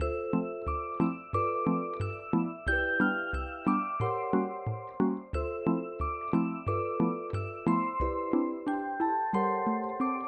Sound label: Dream Chimes; Samsung; Galaxy A56; ringtones